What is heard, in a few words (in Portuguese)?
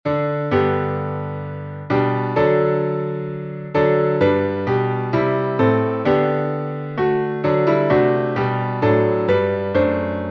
salmo playback